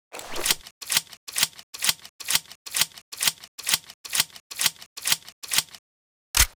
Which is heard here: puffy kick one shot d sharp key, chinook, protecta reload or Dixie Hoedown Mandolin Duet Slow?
protecta reload